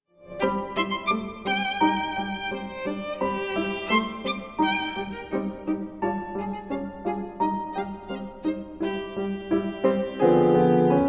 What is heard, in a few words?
melodies for violin and piano andante; sergei sergeyevich prokofiev; op 35a; andante non troppo